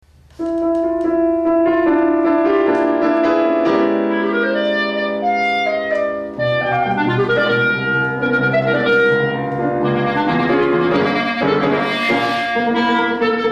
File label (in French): jeux clarinet